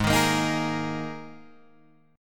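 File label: Ab Suspended 2nd chord, Guitar, Open G tuning; 6,8,8,8,9,8; down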